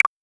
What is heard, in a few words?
short ui click sound soft; mobile game